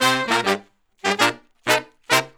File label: Horn Section